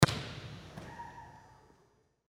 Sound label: volleyball tap serve